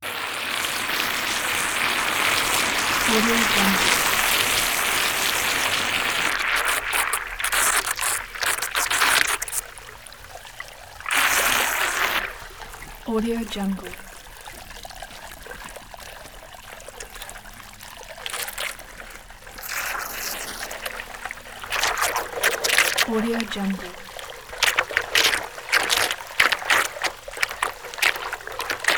water overflow intense liquid suction

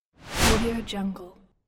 swish sound

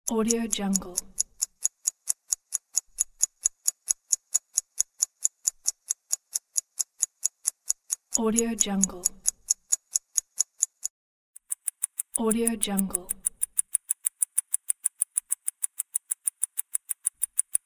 clock ticking

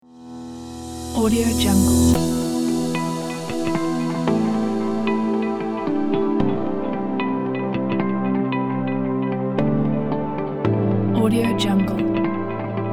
epic technology

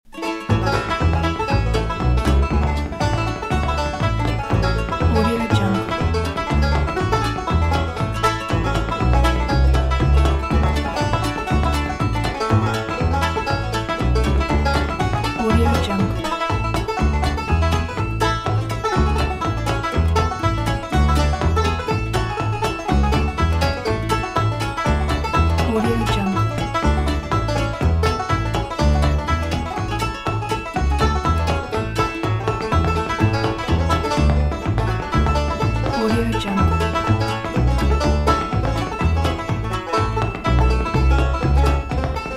happy banjo; soundlufs

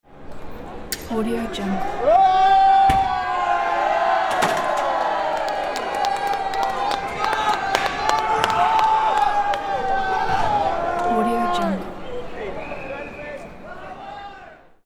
riot protestors angry mob

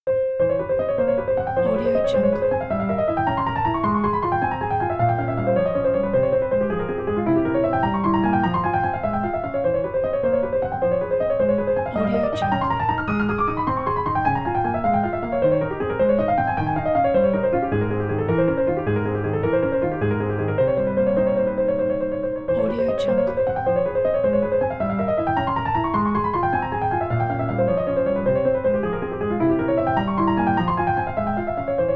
etude in f minor; chopin etude no2 in f minor op 25

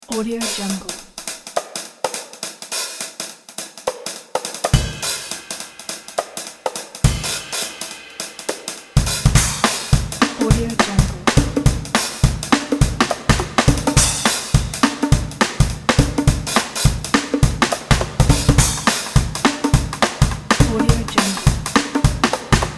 modern stylish jazz drum solo intro

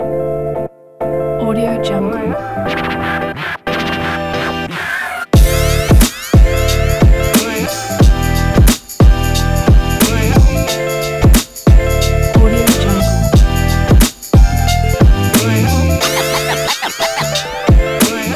hip hop vlog boom bap

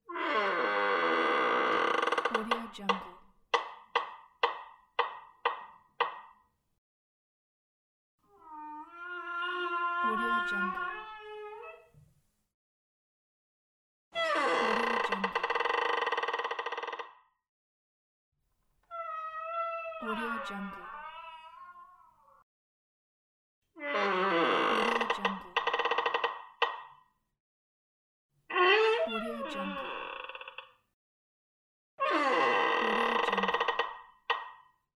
door long creak

